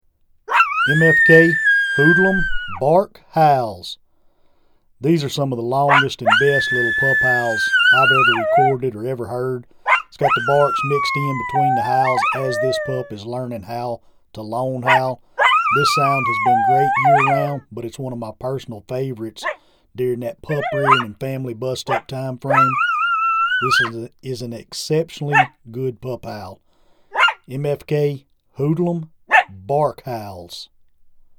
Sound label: Bark Howls; Hoodlum